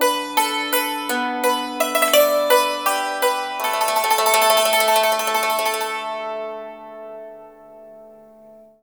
YANG CHING, Heart of Asia; Spectrasonics

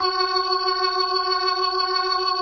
B3, First Call Vintage Keyboards

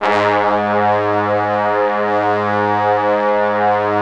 G#1, Orchestral Brass; Best Service ProSamples vol.20 - Orchestral Brass; AKAI

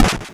snr; Mono

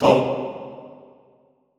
TONG C2, Extended Classical Choir; Best Service; SHORT TONG

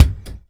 BD, Bassdrums, Roland TD-20

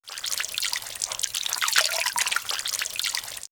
Nature Small Waves; X-Static Goldmine

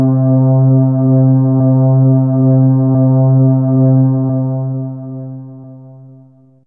Vns Mid C3, HiString

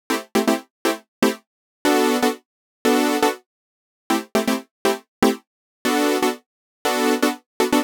Chord Synth Am, 120bpm; Zero-G; Extra Chord Progs

